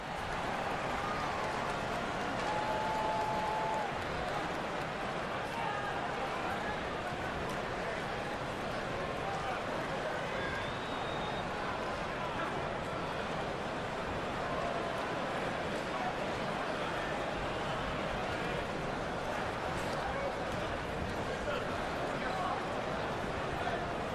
铺底, 战斗音效; nba

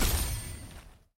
VS碰撞; nba

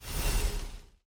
详细信息页签动画; nba; 球员升级

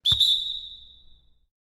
犯规; 战中; nba

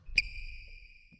摩擦; 战中; nba

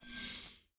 球星图鉴 升星成功回收; nba

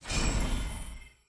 列表刷新通用; nba

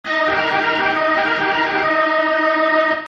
主场风琴上行; 战中; 战斗音效; nba

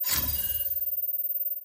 升级成功音效; 球员升级; nba

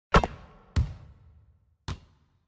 投中, nba; 战中

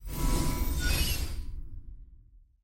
球员装备 装备信息转场; 升级 装备 技能; nba

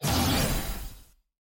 拍卖行页面转场; nba

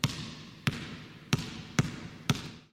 拍球; 战中; nba

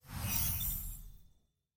季后赛 上方logo转场; nba

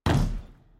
未命中; 战中; nba

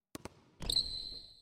传球, nba; 战中

